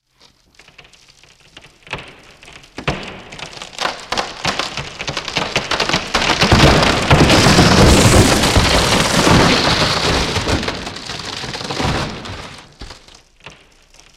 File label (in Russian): padenie dereva